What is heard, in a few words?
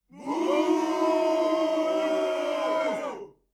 boo short